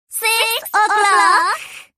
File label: clock